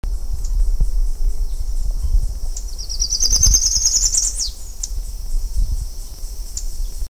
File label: Parula pitiayumi call and song